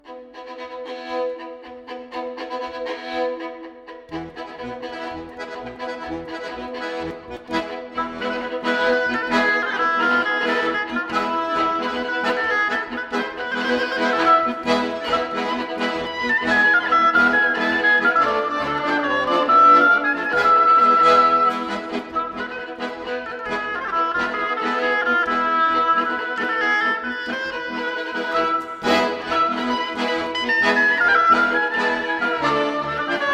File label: Rumenish Bulgarish trad